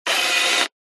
technology camera dslr lens zoom canon dslr eos 6d; zapsplat